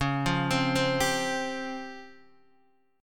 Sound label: C sharp Major 7th Flat 5th Guitar DADGAD